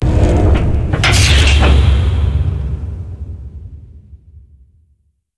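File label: silo door end close; hl2 ep2; ambient, levels, outland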